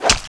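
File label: knife hit body; wanted; weapons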